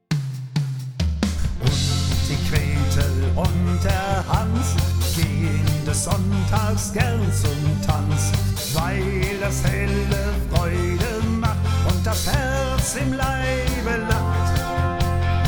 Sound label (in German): Horn Mix; Das Helle Vers